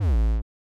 shields hit

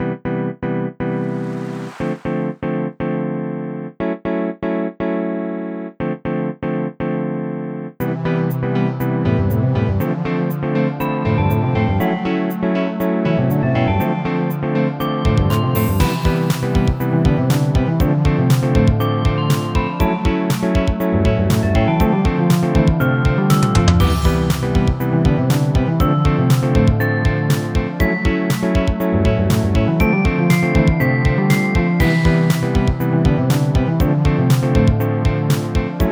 jazz

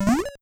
lift, 8-bit Platformer SFX

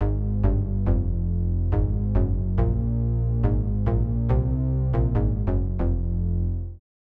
gameover

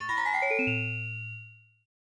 magical

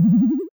boss prehit

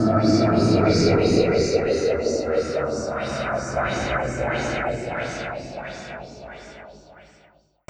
Spirit Sound

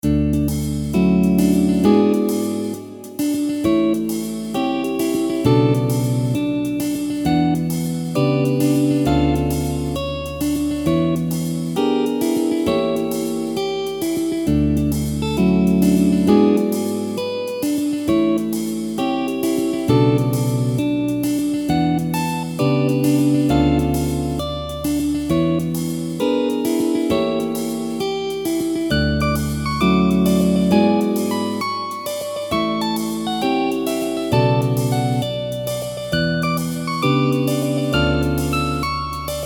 jazz

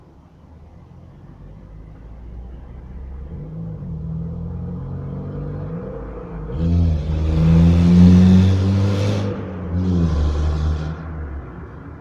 car, Moving car sound

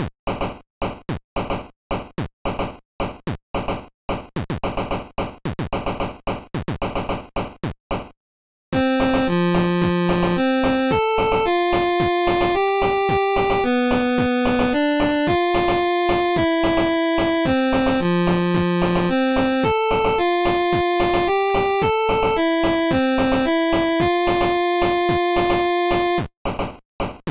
title screen

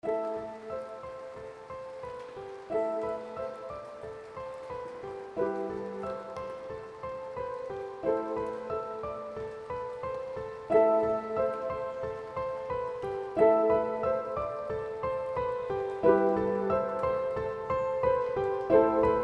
medieval forest fantasy

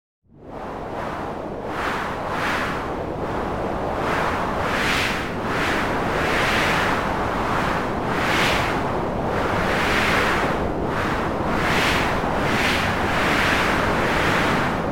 strong wind blowing

